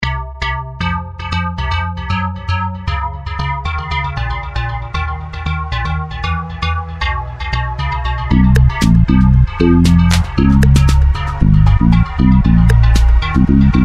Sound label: instrumental